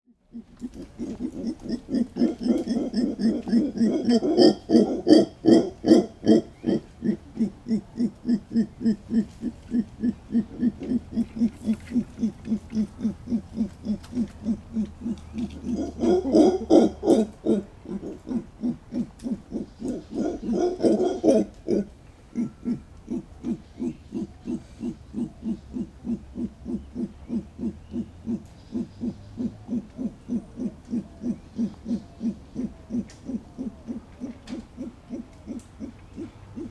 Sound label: mantled howler alouatta palliata, zvuki revuna obezyany